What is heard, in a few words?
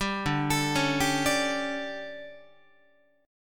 D Major 7th Suspended 4th, Guitar; arpeggiated; 10,10,12,11,11,13; 4A,4E,5A,5D,5G#,6C#; chord